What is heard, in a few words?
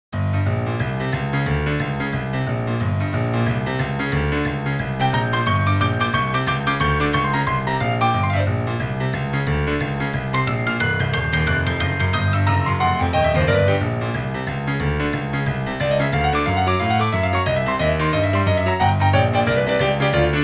Aggressive